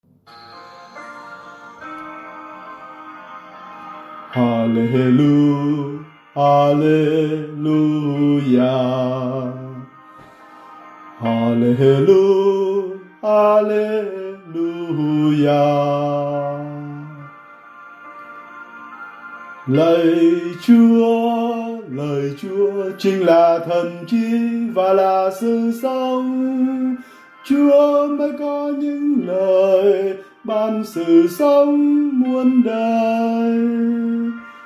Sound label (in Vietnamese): Halleluja Nam